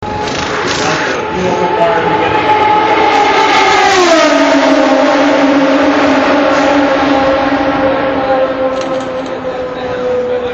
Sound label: ethanol car track sound